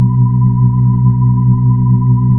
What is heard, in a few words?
B-3 Chorus; Roland LCDP10 Keys of the 60s and 70s 2; Br. Ch. Xfade; Br. Chorus Wh